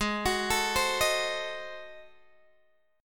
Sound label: Ab 7th Flat 9th down Guitar Open G